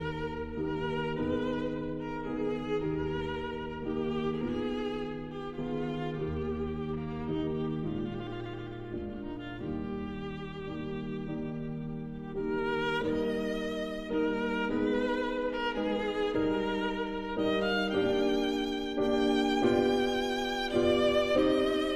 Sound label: Sicilienne for violin and piano